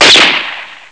bullet echo; Amiga Soundtracker Sample Packs

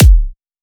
vape kick

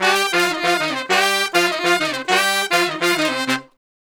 Horn Section

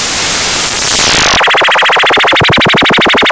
LFO seq; Big Beat Samples & More; AKAI; ESO SEQS KIT

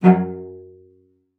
F#2, CELLO SPICC; Xsample VOL-5

